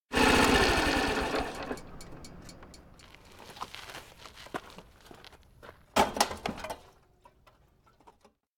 bike park; anemoi

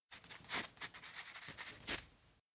diary write; spica; anemoi

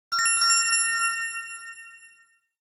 elec kiraan head; makoto; anemoi

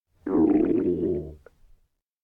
stomach growl; ken; anemoi

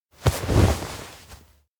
lie on knees; awayuki; anemoi パッケージ版

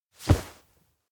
grab arm; koyomi; ukishima; anemoi パッケージ版 1.1

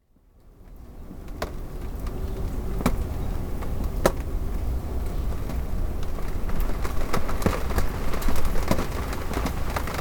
rain gradual; anemoi

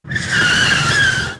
bike crash before; anemoi

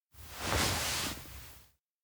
lean in; anemoi パッケージ版

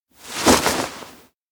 surprised look; ken; spica; anemoi

